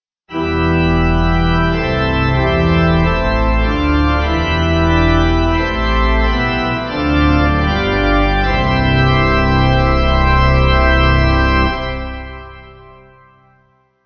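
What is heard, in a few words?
Embolism Acc Pipe, Pipe Organ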